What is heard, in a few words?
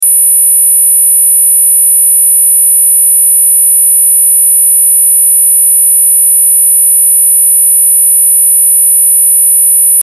405 TV line whistle